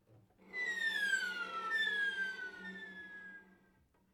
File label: Violoncello Seagull